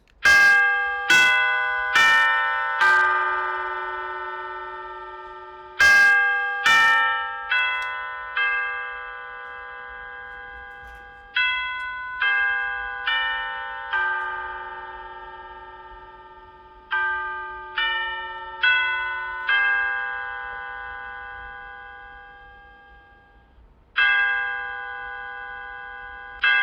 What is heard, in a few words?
Chime